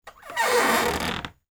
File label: floor squeak